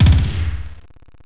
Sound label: click